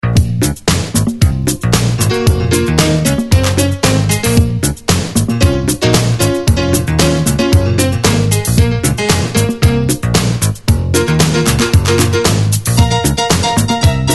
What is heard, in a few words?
Disco, Piano Dub